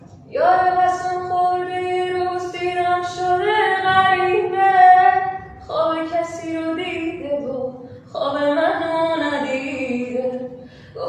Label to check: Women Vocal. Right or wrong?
Right